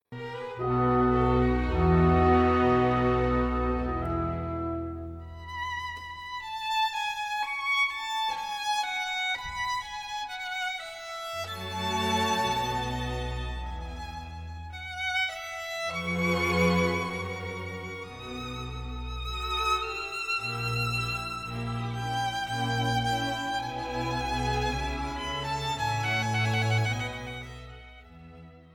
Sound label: Violin Con